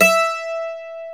MANDOLIN; E-MU Producer Series Vol. 2; More Studio Essentials; Composer

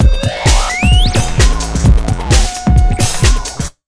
JUMP 130, Big Beat Drumloops

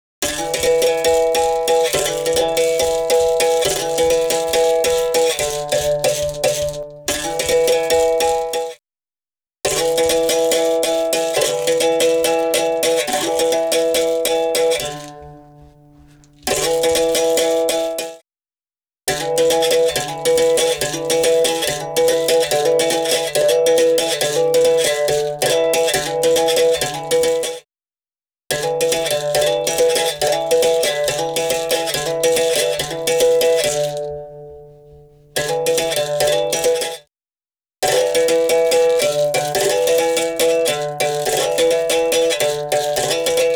Didgeridoo And Overtone; Good Stuff Audio

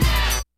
HITS BITS; Kid Nepro - Elite

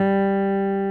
STICK, GUITAR FX

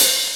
Victory; Fire Emblem Thracia 776; Close to Victory

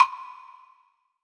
WoodBlock; Percussion; REVERB; MARKYDRUMS

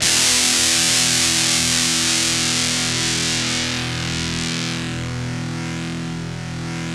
shredder, bizarre guitar; Spectrasonics; SHREDDIES

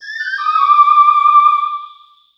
Analog Synth, Arpeggios; Synths; Electronic Point Blank

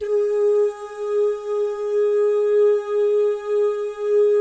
DOO, jazz FX, mix guy gal; Spectrasonics; Vocal Planet

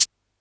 CABASA, Yamaha DD-6

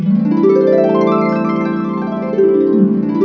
GLISS, HARP; AKAI S-Series CD-ROM Sound Library VOL-2

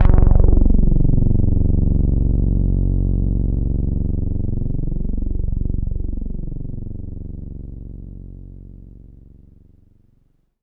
Polytaur f#1, Korg Poly-800

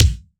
EL KICK, GM drum set; AKAI S-Series CD-ROM Sound Library VOL-8

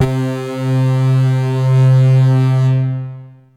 Techno leads, hits and riffs

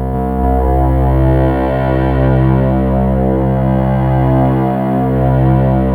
BIG PPG, OLD PPG; Sound Gallery - Charged

